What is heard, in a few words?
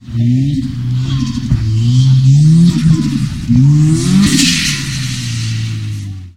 blowoff